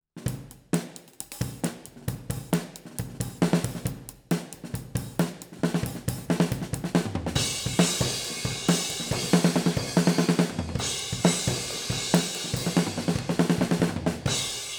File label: Kick, Distance OH